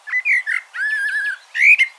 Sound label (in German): amsel